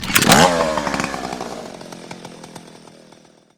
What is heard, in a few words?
chainsaw start